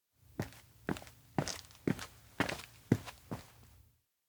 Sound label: steps outdoor school walk; anemoi